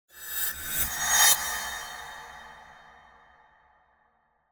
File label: Whoosh Metallic Thin Attention Grabber - Triple; Hollywood Edge; sound designer's toolkit v2